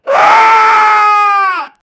death

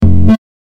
error sound

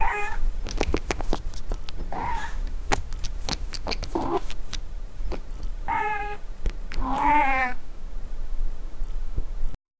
a cat wearing clothes

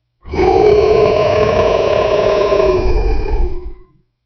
high quality epic dinosau

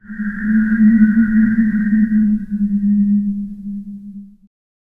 distorted humming, magical shimmer

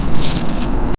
picking strawberry from b; plant movement

